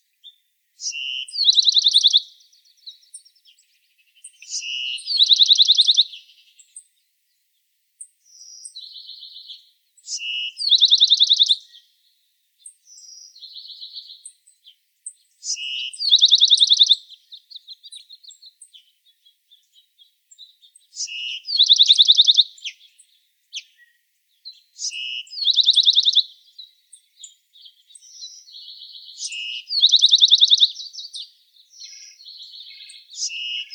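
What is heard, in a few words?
Bewick's Wren, Wrens Kinglets Gnatcatchers; BEWR; deviche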